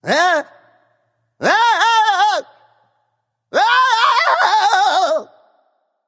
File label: nv fear; smite; voicepack; ganesha